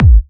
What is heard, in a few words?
Bassdrums UK Trance Kicks; Vengeance Samples